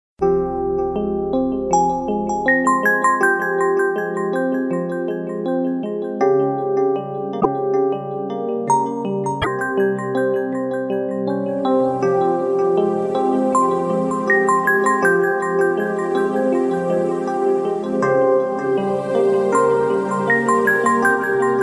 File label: nice ringtone